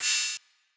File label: Hihat; Ghosthack Advent Calendar Day 4